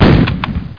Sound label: shotgun